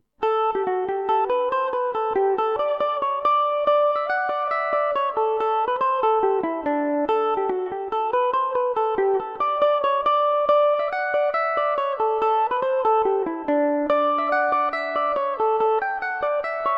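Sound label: D major revelation, Irish mandolin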